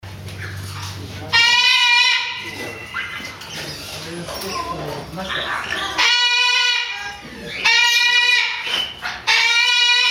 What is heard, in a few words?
Moluccan Cockatoo, parrot calls